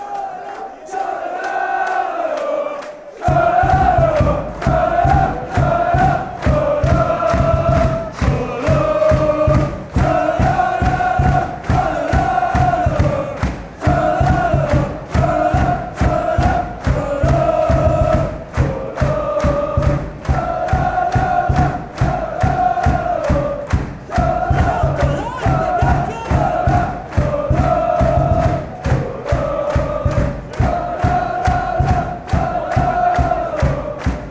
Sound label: tralalalala chant; salzburg